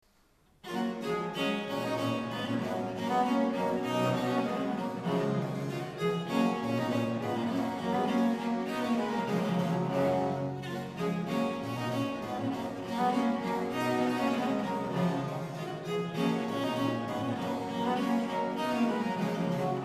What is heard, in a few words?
gavotte sol maj; marais